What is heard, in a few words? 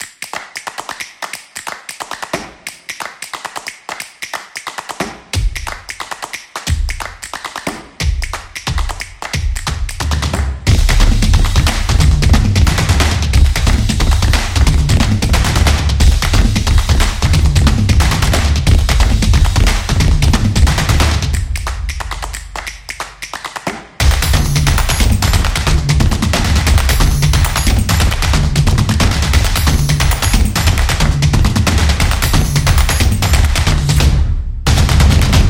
Clap Clapping